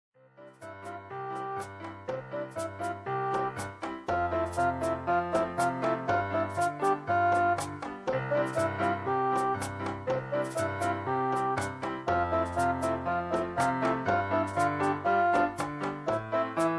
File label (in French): la souris et le grizzli instrumental